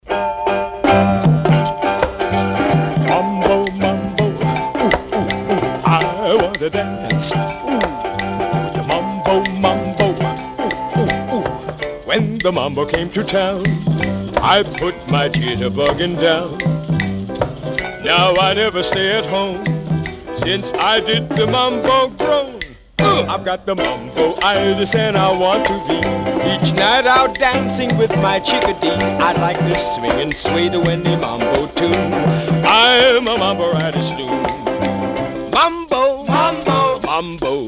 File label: Piano and Orchestra - Mambo